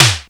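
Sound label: snare drum; Greytsounds; F2 SNARES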